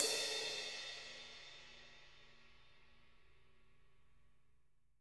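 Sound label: 16 crash mf, jazz crashes, Living Drums; Sampleheads; Peter Erskine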